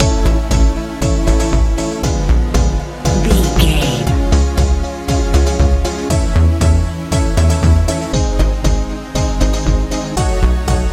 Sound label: euro dance